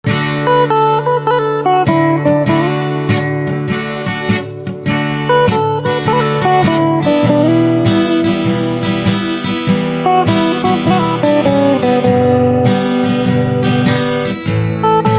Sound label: Guitar Instrumental Ringtone